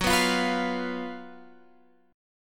F sharp Major 7th Suspended 4th F sharp, Guitar Open F; F#M7sus4 strummed; x,13,11,12,14,12; chord shape